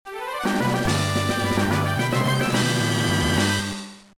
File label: Jackpot